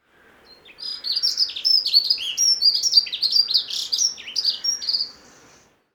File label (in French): accenteur mouchet